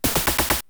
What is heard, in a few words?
Stair